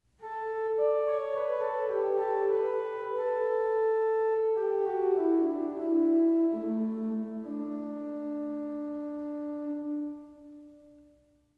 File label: 1st Diapason